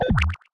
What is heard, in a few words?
Button Bottom Bar Click; Custom UI Sounds; Slime Rancher